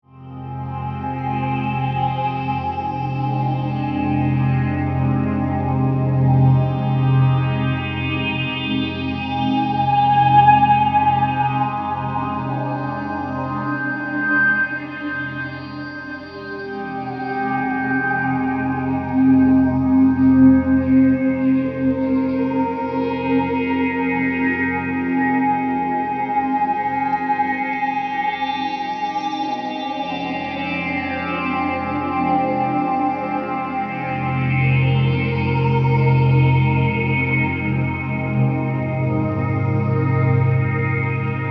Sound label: pad